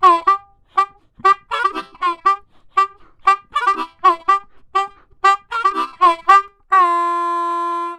Blues G Harmonica 120; Blues-loops-no-key-change

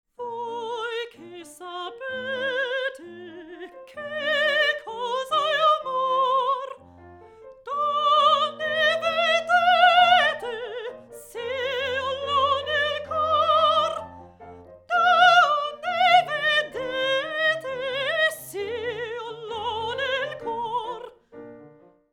Vocal Spot Mic U47FET

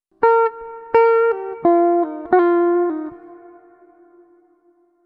Blues Electric Guitar Notes C 85

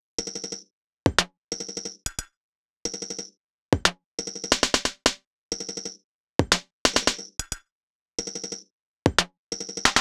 90 Percussion; Downtempo; Drums- Downtempo Loops & Samples; RootOfUnity

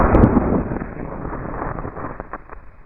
distant Explosion

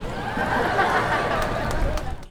crowds

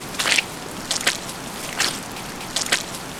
Footfalls Gravel Wet; footsteps

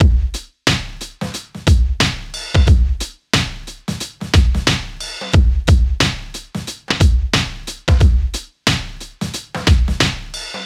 Full Drums, Downtempo; Drums- Downtempo Loops & Samples; RootOfUnity

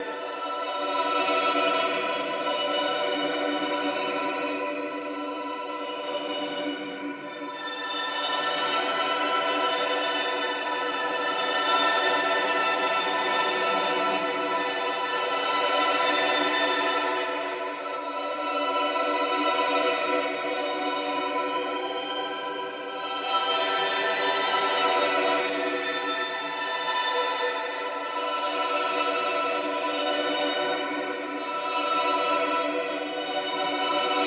Bm Guitar Drone Move; Ambient Textures; Instrument Drones

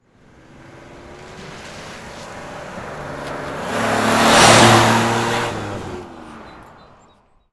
cars; vehicles

